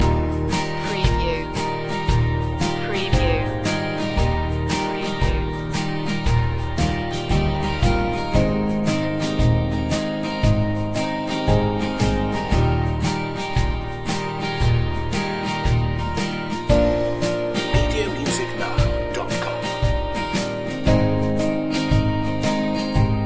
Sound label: loop